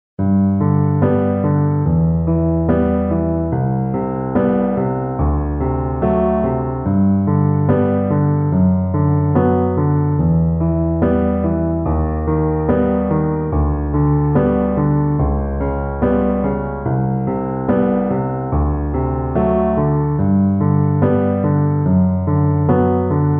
kleine improvisation

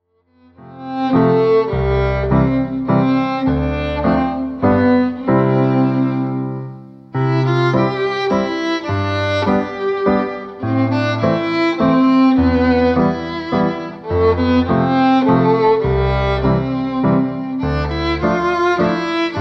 Menuett

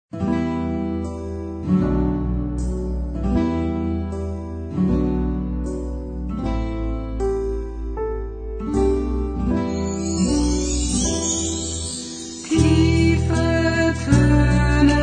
tiefe toene

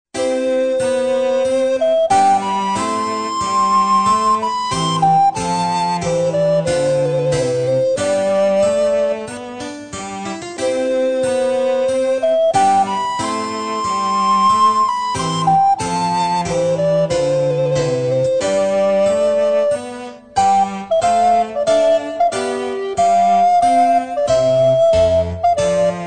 sonate nr 3 in c dur i larghetto

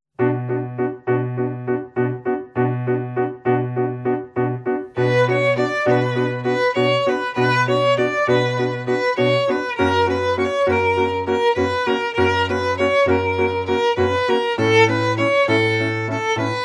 tango argentino hoerversion

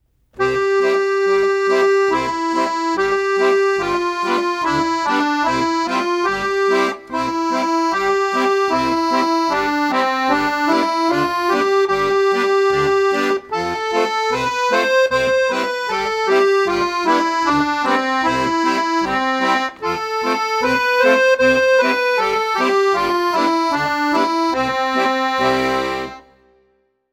passend zur tonleiter

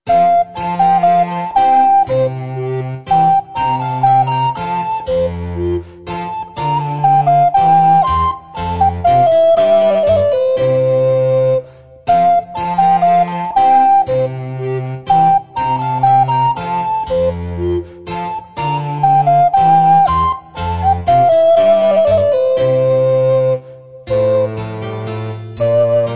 Menuett

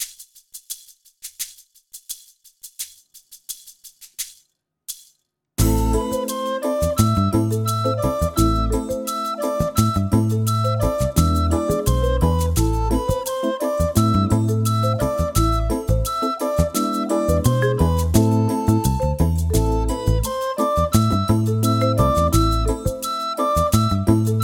rondo a moll

